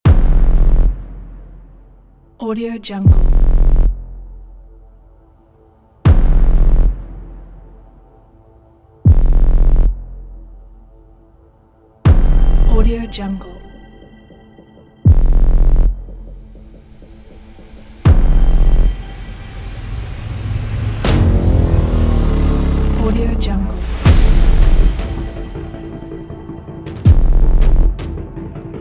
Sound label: tensional documentaries